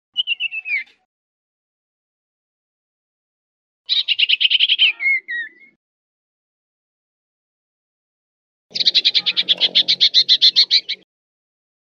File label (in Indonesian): siulan cipo untuk pancingan